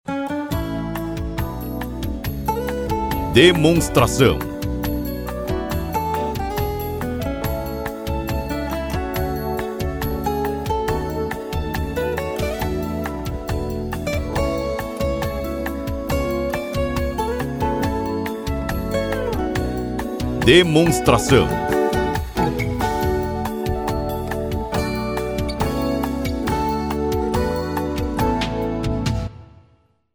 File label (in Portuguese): Trilha